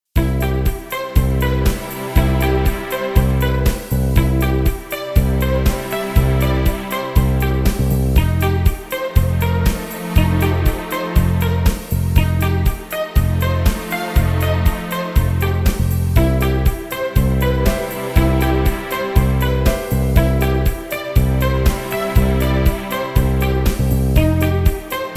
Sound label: Motivational Movement Background Music